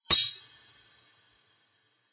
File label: coin count end; cashnoire